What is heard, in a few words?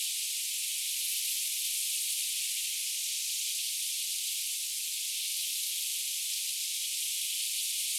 Telekinesis; Boomerang Fu